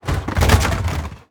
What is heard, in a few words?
plastic break